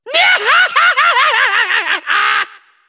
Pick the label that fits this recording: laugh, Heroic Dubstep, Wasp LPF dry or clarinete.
laugh